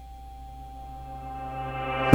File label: FARFISA ORGAN